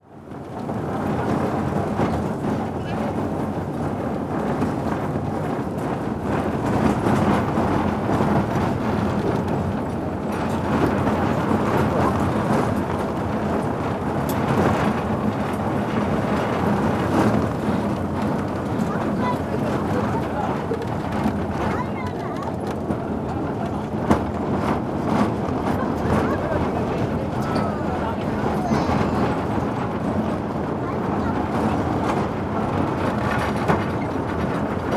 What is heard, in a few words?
berlin Spreepark Bahn